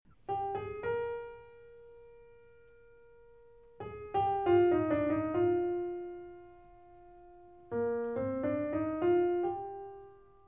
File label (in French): Chanson